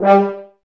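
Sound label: Brass Horns G; Sample CDs; Miroslav Vitous; Woodwind Brass Ensemble; F.Hrns Links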